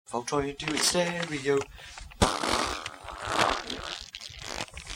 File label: stereo, farts